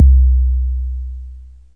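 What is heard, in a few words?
808 bass drum; Kicks; single hits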